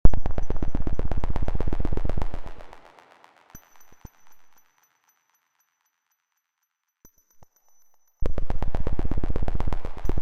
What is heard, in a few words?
frequency